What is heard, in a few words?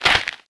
dirt, player